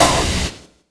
SD, Drum & Bass, 150 BPM; Ueberschall; Drum & Bass Resonance

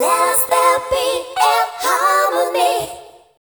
FEMALE CHOIR, Dance Vocals

